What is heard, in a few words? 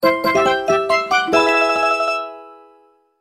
coin clear; ringtones; games